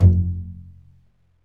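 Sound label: STRINGS, Cbs Pizz; Roland L-CD702; Cbs FX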